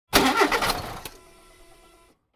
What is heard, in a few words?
Car ignition failing